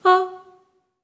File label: STACC F#4, Symphony of Voices, SOP STACC